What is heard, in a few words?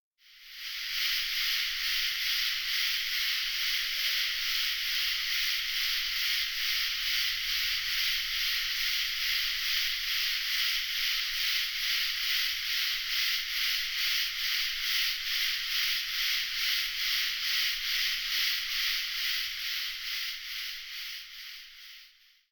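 common true katydid chorus, Northern Illinois Insect Calls; insect musicians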